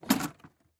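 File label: sounds of a baggage carousel at the airport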